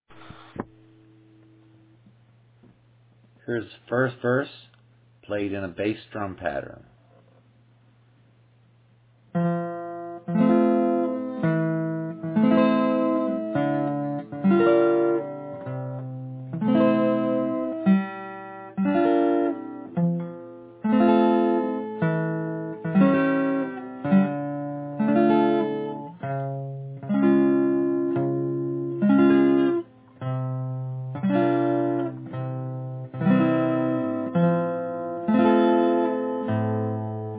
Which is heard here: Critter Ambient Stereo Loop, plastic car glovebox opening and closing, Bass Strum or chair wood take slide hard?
Bass Strum